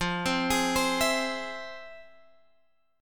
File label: F Minor Major 7th, Guitar Drop D, chord